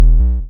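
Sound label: waveform FM triangle triangle envelope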